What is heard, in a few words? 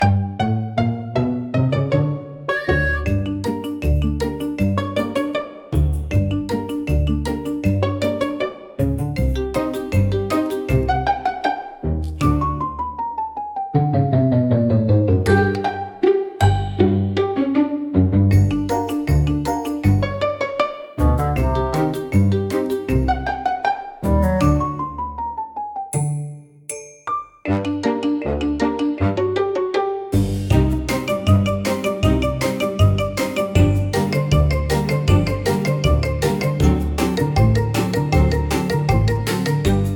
Marimba Mischief, comical marimba for funny scenes